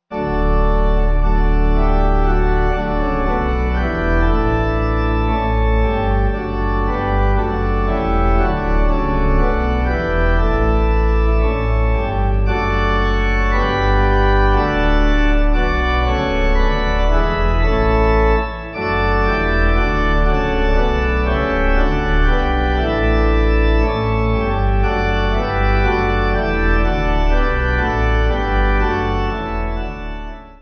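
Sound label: Pipe, pipe organ; Now All The Vault; Lasst Uns Erfreuen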